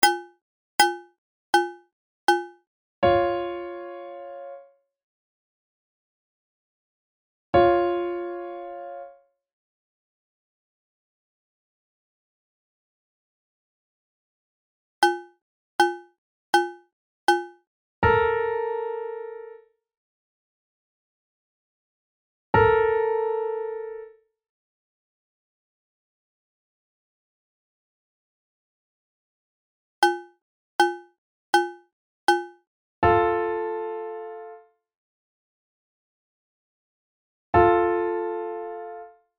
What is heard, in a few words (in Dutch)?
harmonische intervallen; hanze; prins claus conservatorium